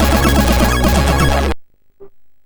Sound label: Tube Madness, MOS6581R4